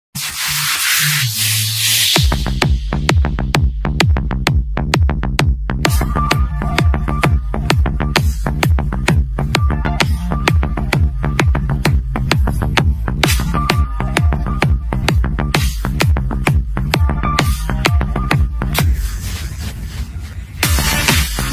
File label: song cover bgm ringtone; kanguva; disha pattani